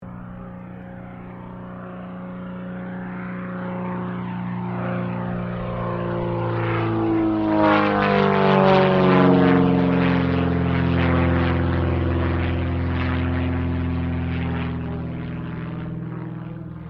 Aircraft CAC CA-25 Winjeel dive pass, WW2 era Australian training aircraft; Airplanes